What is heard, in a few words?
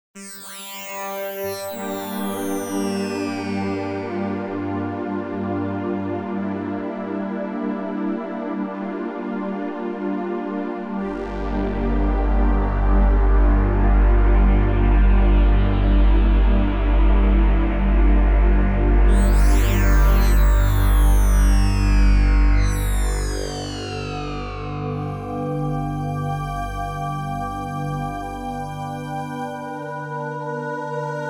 Pads Clusters